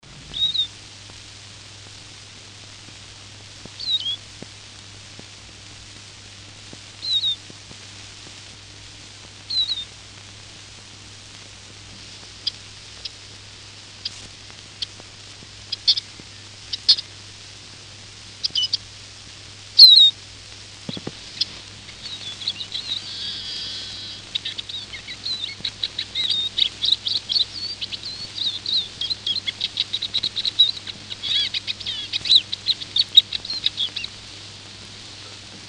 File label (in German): Zeisig; Vogel